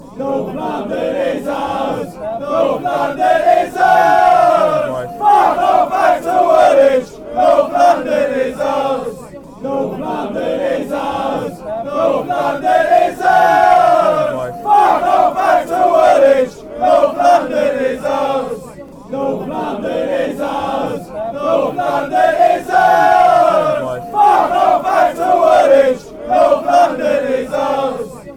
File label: north london ours, chants